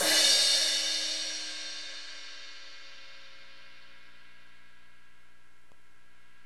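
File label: CRSH, crash; Roland LA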